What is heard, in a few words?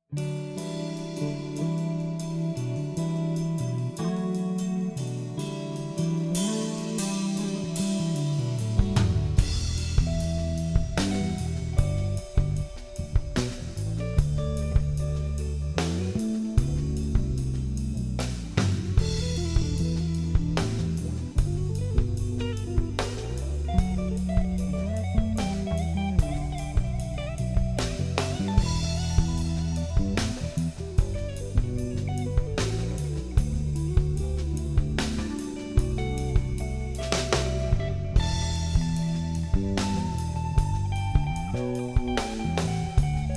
Jazz Deluxe